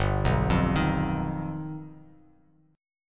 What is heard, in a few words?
G Augmented 7th, Bass Standard; G+7 arpeggiated; 3,2,3,8; JGuitar